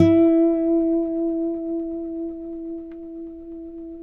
Finger E 4 R, Guitars; Spectrasonics; Hans Zimmer Guitars VOL-1

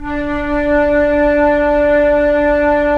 FLT FL, Flute; Roland - LA Composer vol. 4

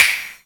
SNAP; 90 s Sample CDs; Best Service Dance Mega Drums

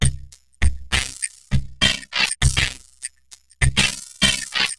Robo Beat 100BPM, sci-fi Beats

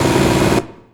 CYBER KIT, DRUMS

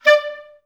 STAC D4, Advanced Orchestra; Best Service; Peter Siedlaczek's Advanced Orchestra

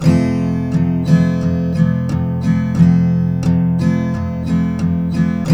TK MJ F#2, Guitars; Spectrasonics; Hans Zimmer Guitars VOL-1

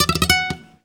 GUIT SOLO; Best Service; World Colours

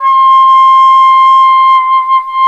SAX C4, SAXOPHONE; USB Soundscan vol.63; Balkanish Traditions; AKAI

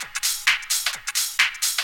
hihat 2, 130, drum loops

